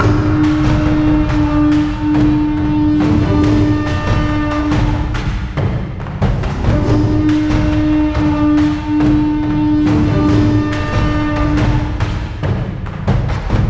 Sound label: Action loop; shieldwall chronicles swords of the north